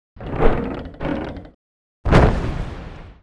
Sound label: Cannon Attack; Knight Online